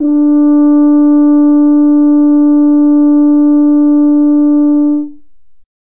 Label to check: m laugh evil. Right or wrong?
Wrong